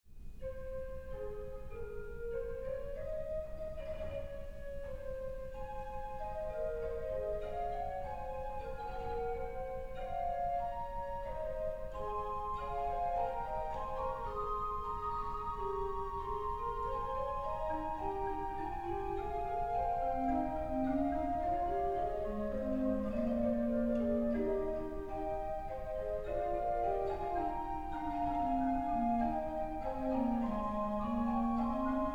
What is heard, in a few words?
Bach Organ Works; BWV 756; Nun ruhen alle Wlder; Individual Chorale Preludes